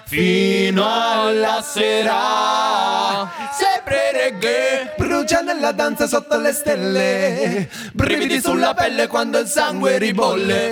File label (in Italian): fino a sera sempre reggae